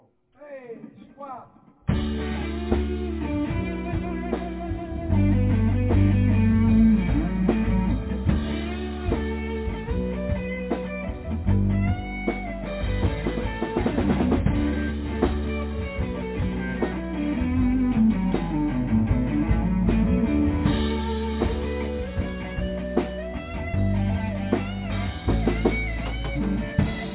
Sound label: solo